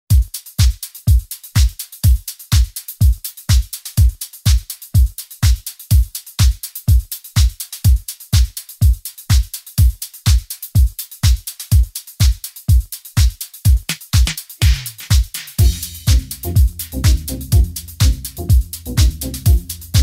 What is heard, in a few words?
Spot, Deep House Music; 2o EPAL LARISAS